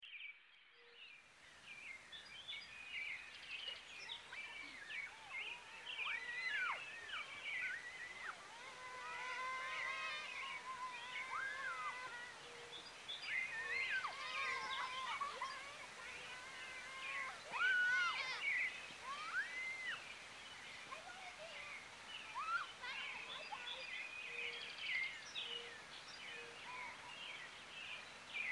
Sidewalk and Park Ambience; uDraw Studio

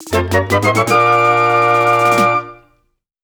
Big Item Get Fanfare; legend of zelda the links awakening 2019 switch

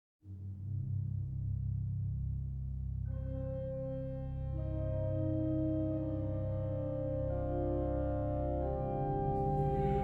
Chorale; Untold Legends Dark Kingdom; PlayStation 3; Sunken Cathedral

